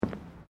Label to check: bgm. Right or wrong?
Wrong